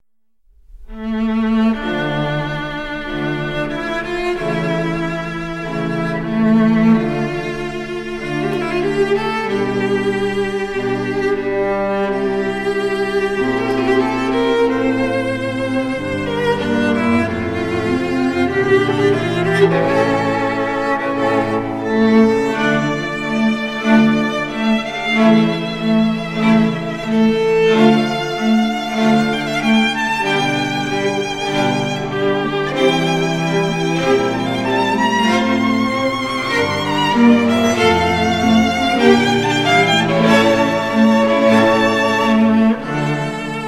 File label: String Sextett No 1 B Flat